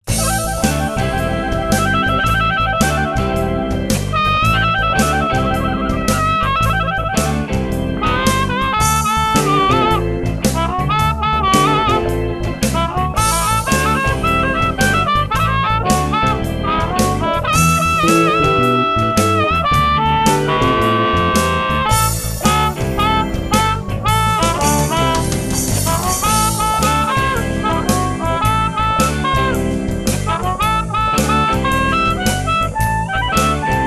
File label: little harp, ampli lampe